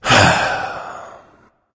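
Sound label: non-verbal sigh; smite; voicepack; houyi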